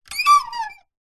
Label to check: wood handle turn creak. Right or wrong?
Right